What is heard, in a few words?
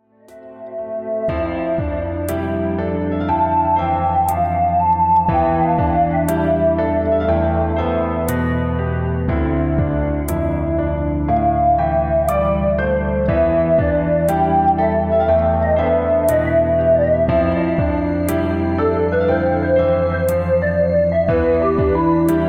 bass